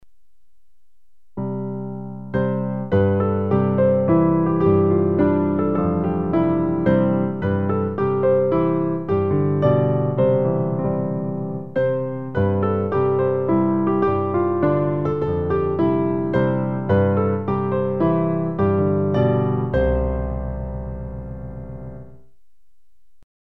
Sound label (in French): piano; recueil Louez Dieu